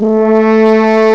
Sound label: DUO HORN, BRASS; BRASS2